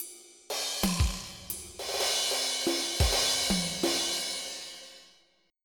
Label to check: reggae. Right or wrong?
Right